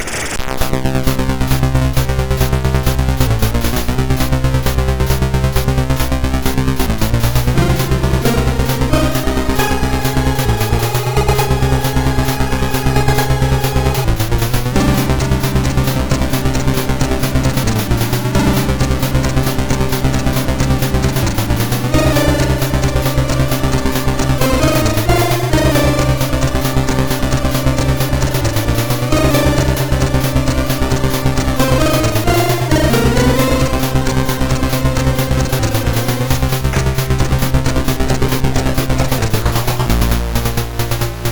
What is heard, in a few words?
Jail Break, MOS6581R2